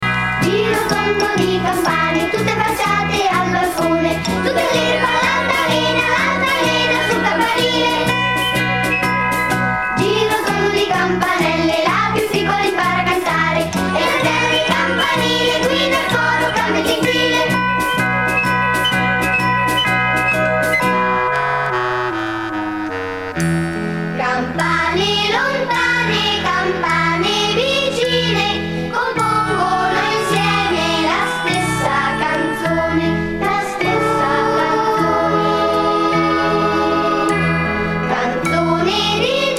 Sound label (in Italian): Girotondo di campane